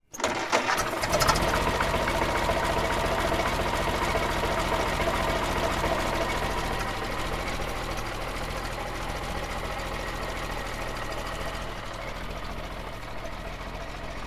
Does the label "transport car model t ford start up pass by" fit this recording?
Yes